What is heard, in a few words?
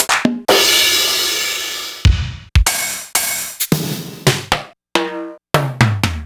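Roland MV8800 drum K-100 R KIT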